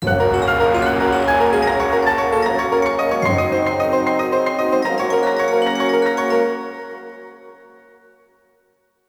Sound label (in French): Jingle Liberation Copain; Evil Twin: Cyprien's Chronicles; Dreamcast